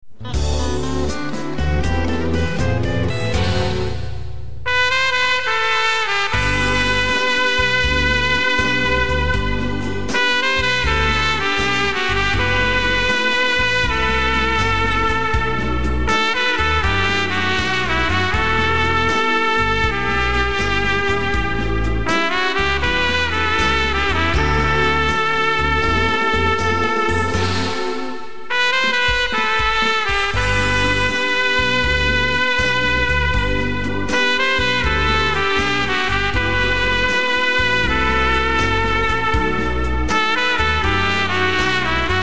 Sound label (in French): oceane melodie trompette